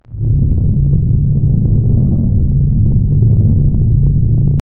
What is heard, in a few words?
room glitch drone